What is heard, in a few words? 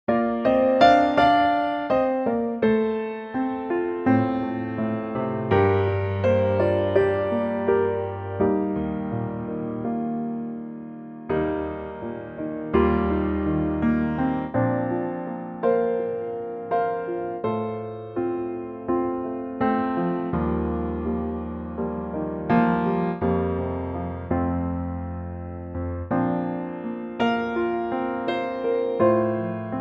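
piano Bb C, male; key - Bb to C - vocal range - F to G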